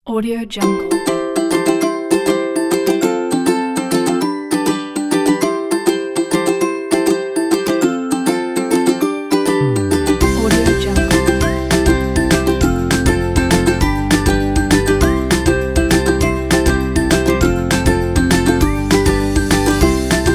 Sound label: upbeat ukulele happy